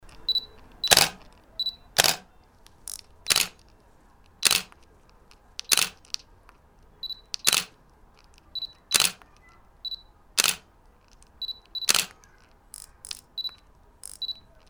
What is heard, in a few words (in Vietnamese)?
Tieng man trap may anh chup lien tuc